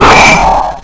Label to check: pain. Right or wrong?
Right